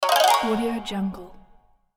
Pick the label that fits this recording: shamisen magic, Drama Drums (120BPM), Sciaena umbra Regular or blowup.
shamisen magic